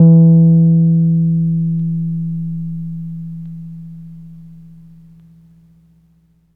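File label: E PNO E2 MP; Synclavier Sampler Library; KEYS AND GUITARS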